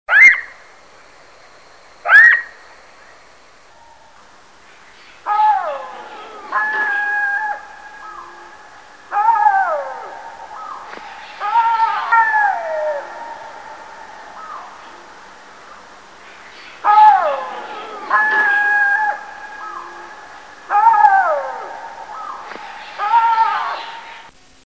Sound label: Canis aureus; voice